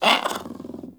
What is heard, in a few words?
Kitten Speak Playful; Warframe; Catbrow